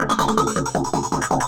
Throatbeat; Sonic Foundry (Sony Creative Software)

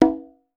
quinto, congas; Voice Crystal - Steve Reid Definitive Percussion

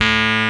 RHODES A2; Vintage

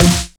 Industrial Beater, Kicks; Drums

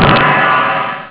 super crash; ST-XX Amiga Soundtracker Sample Packs

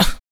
KUK, MALE PERC

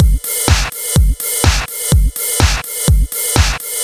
Drum Loops, 125 BPM; Sonic Foundry (Sony Creative Software); Underground UK House & Electro

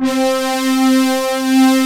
SWELL; Pro-Rec - Synthsonic; Z1 DANCE

